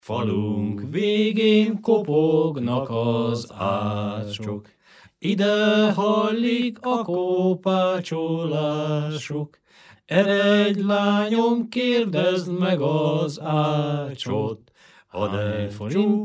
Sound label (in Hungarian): nepdal; falunk végén kopognak az ácsok